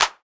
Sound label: clap one shot slap; Claps